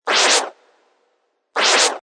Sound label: Single record scratch effect.; DJ; Musical